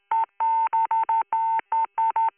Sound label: morse code tones; phone